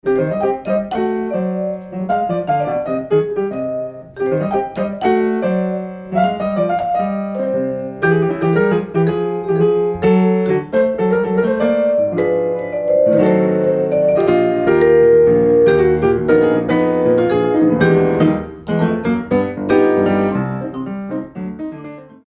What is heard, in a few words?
Rumba